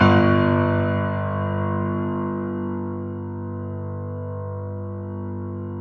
PED FF G, GRAND PED; Best Service Advanced Media Trax Modern Composer

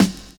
SD, snare, 70 s Breakbeats

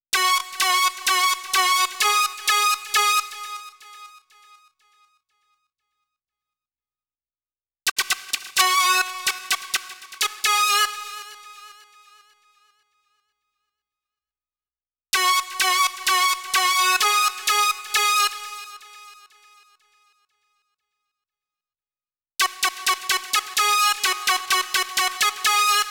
synth lead; Session 09 - Retro Fit

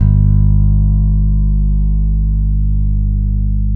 SOUL, Bass, A 2; Spectrasonics; Bass Legends

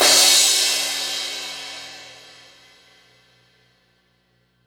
Cymbal; Sonic Foundry; Sony Creative Software; Hydroponic Hip-Hop; Beat Components; Hi Hat Cymbal Patterns

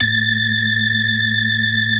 BLOOD OCT, Synth and Organ

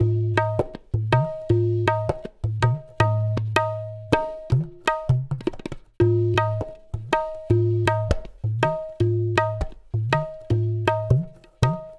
TABLA; Sonic Foundry (Sony Creative Software); Continental Drift Aftershocks; East Indian

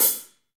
HH hh; Best Service Gigapack 2

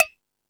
Thundadome, E-MU Pro-Cussion

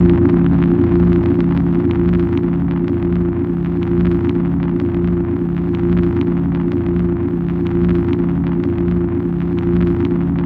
SUB WAVE, Special FX Twisted

